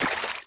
puddle

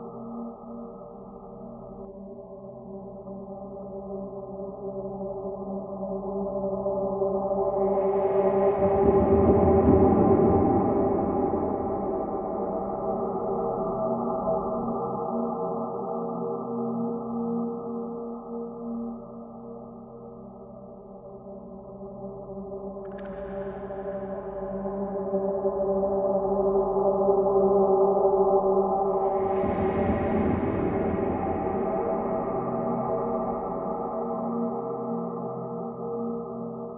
Ambience; Mace Griffin Bounty Hunter; XBOX

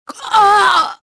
choke; Star Wars Jedi Knight II Jedi Outcast; XBOX; mp generic female

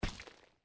chunk fall, gore; Soldier Of Fortune II Double Helix; XBOX

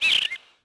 bird; Tony Hawk's Project 8; XBOX

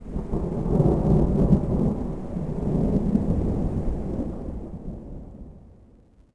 distant Thunder; Star Wars Obi Wan; XBOX

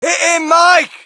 crowd, shout; Mike Tyson Heavyweight Boxing

